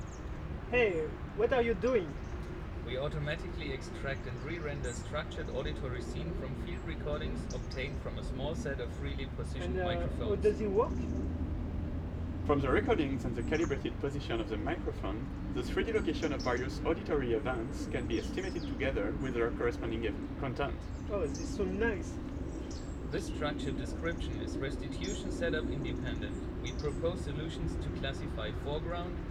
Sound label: mono Outside